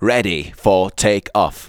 vox; Ueberschall; Techno Trance Essentials

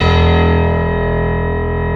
MIDI PNO, PIANO; East West; Global Sounds

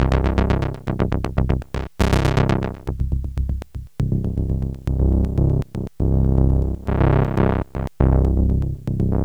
MOOG SEQ; AMG - Vince Clarke Lucky Bastard

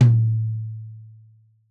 Tom2 Stereo, Deeply Sampled Drums

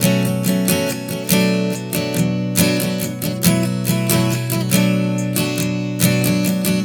Strum 070 Bm, One Chord Strumming 070 bpm; Acoustic Guitar; Sonic Foundry (Sony Creative Software); Songwriter Acoustic Guitar Companion

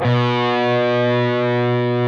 PRS C, Guitars, LEAD

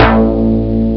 m1 synth bass, Amiga Soundtracker Sample Packs

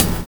LOW TOM; Univers Sons - Kitch 70s

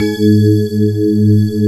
D50 Layer, Roland; 90s Sample CDs; Club-50 - Foundations Roland; D50 Syns

